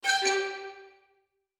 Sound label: xylo strings 2 note down